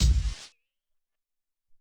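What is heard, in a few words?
Hop Kick 4, Alesis SR-18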